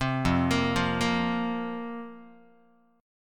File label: F Suspended 4th F, Guitar; Fsus4 arpeggiated; 3,1,1,3,2,x; JGuitar